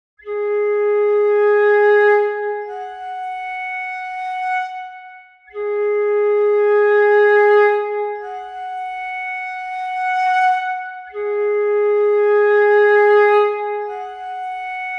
flute passage; run through a separate reverb plugin; sampled woodwind instruments; Berlin Woodwinds; Orchestral Tools

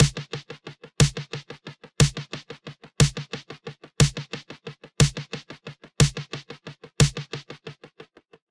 Snare, Triplet Chaos, feedback echo; Newfangled Audio

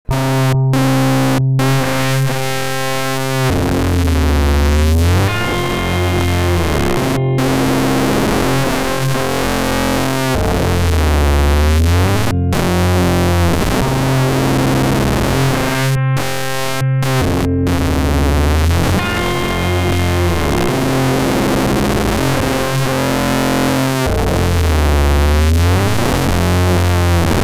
Gtr drum kick farter

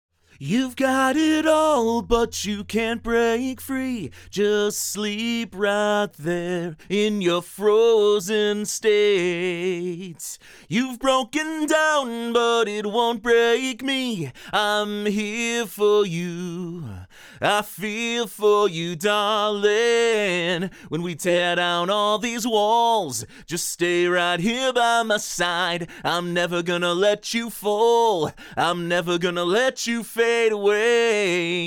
Melodyne Vocal Solo; Melodyne vs Flex Pitch

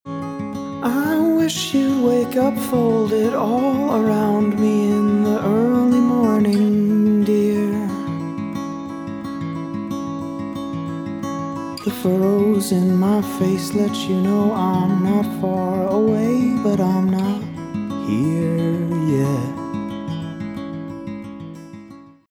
Vocals and Guitar EMT Medium Decay; UAD Spark

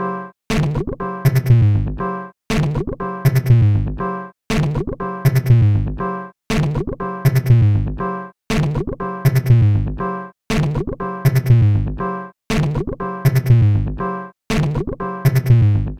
Modular Samples; sampling instrument; Rando by Monkeyc

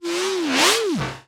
Scale Machine; Tsugi

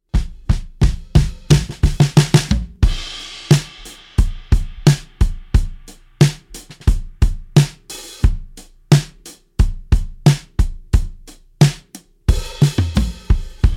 acoustic drums no effect

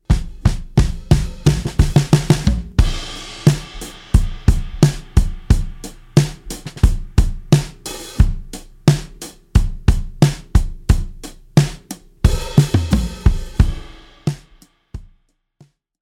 acoustic drums squash

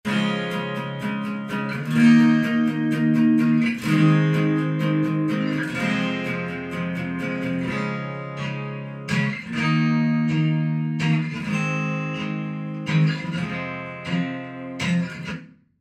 acoustic shower

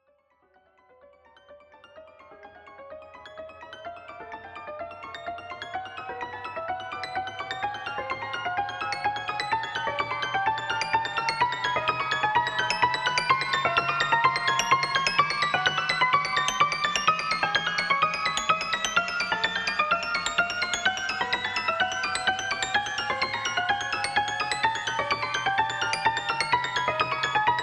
Shepard; NI; Deft Lines

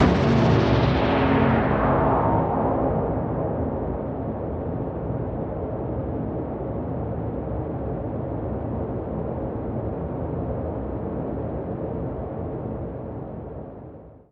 AMBIENT Trailer

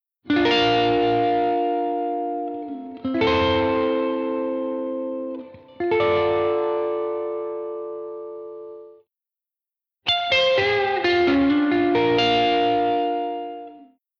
Guitar No Galaxy; UAD Spark

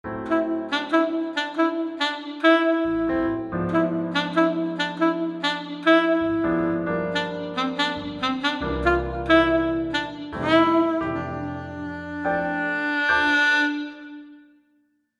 Alto Blue, The blue alto; sampled woodwind instruments; Berlin Woodwinds; Orchestral Tools

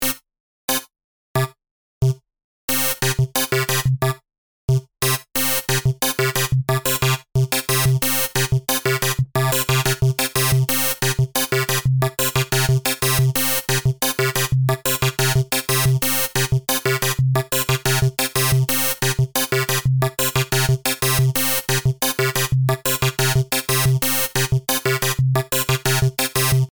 plus gate, sequencer; creating positive values for the gate of every step in the sequence; Numerology; Five12

